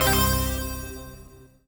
collect item jingle fail

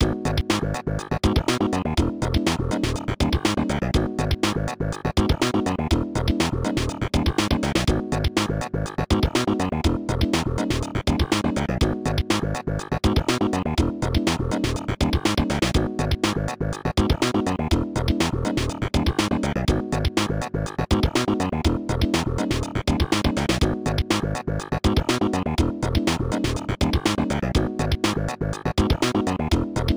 Instrumental; Blubber; Friday Night Funkin' Vs Ourple Guy V3

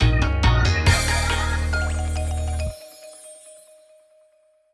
lose stinger; Catch me; Mokoko X

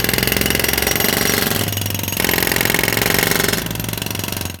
Construction

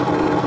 Scrape Loop; smashy road wanted android ios gamerip 2015

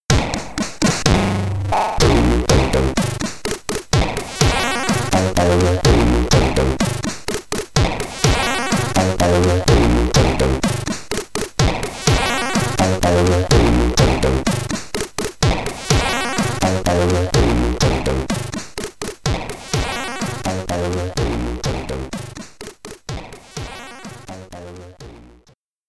BGM, commodore 64; B.A.T.

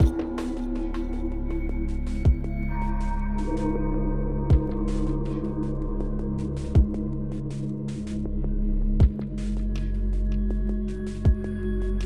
Mix Stem Layer

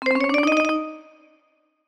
Jingles; Crate

